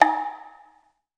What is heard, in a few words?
Tsuzumi Hi, Percussion; Roland BK-5